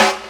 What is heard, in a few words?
SD HOU, Dance Mega Drums; Best Service